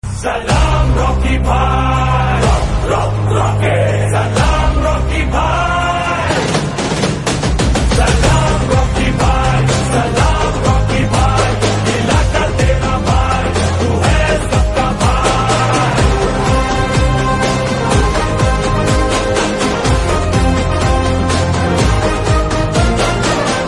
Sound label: Tamil Ringtone; Rocky Bhai; Kgf